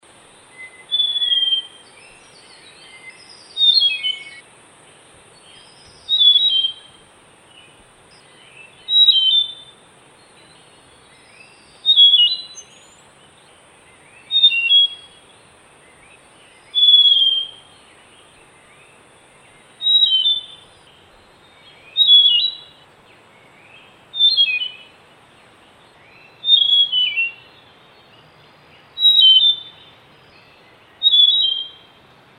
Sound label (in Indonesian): Suara sunda robin